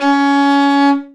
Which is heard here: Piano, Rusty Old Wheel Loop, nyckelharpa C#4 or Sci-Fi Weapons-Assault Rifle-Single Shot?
nyckelharpa C#4